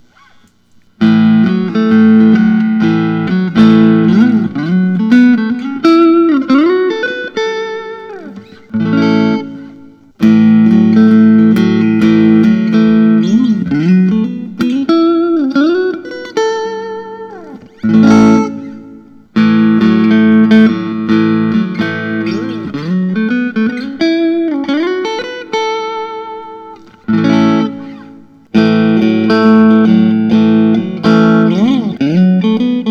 reserve s style natural, guitars; lentz jr

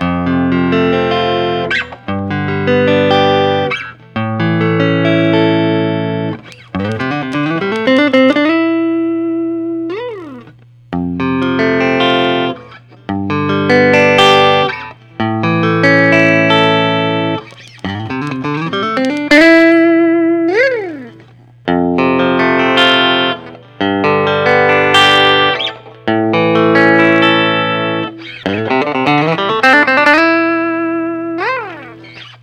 kelton swade avrt telecaster style

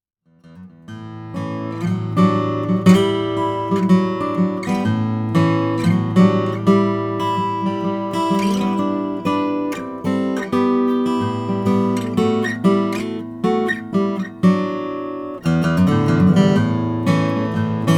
doerr solace select braziiian rosewood

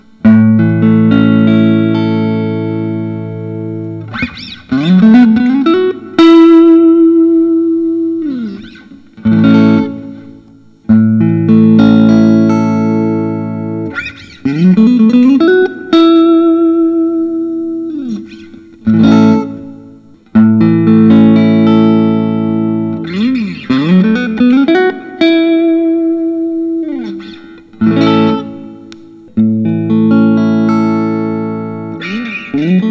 lentz tradition s brazilian aztec gold

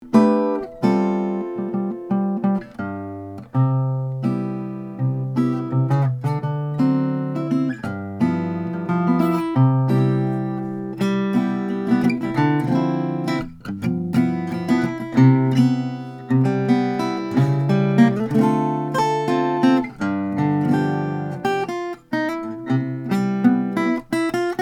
lowden guitars the wee indian rosewood

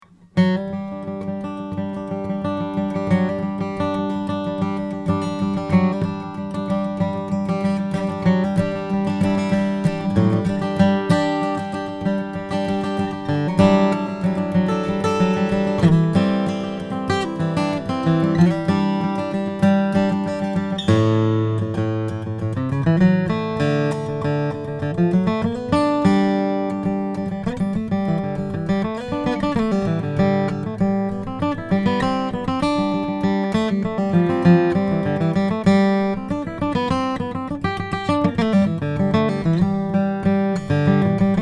martin d 41 indian rosewood

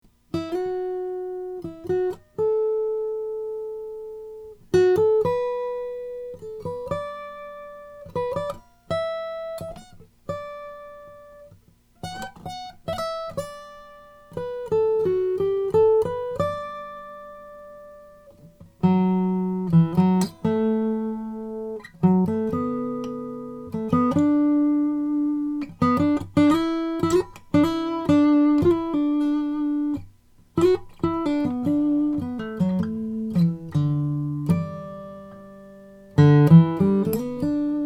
kostal 00 madagascar rosewood

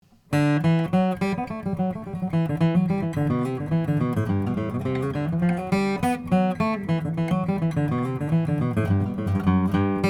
froggy bottom 40th anniversary h12

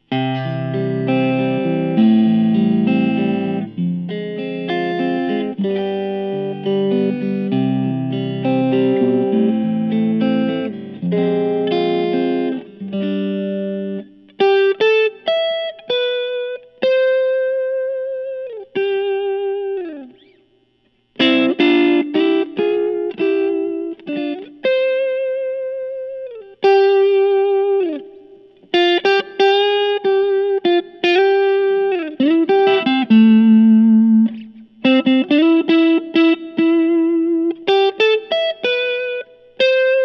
crook t style vintage pink paisley

